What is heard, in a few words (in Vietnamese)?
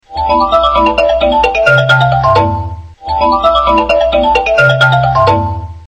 Nhac quang cao